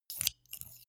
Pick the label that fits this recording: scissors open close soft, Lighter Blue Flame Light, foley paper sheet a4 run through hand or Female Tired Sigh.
scissors open close soft